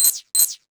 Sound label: waypoint reminder